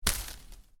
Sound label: Foliage hit soft, Foliage Impact, Soft Foliage Hit; Miscellaneous Impact